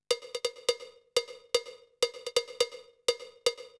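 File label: percussion, house, 125, drum; eJay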